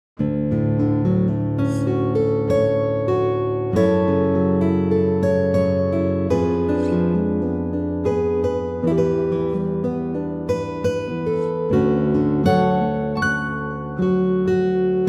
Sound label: Classical Guitar